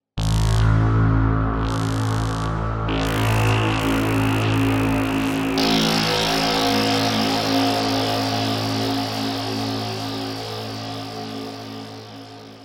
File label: ATMO; Phasewaver; Hidden Apocalypse; syntheway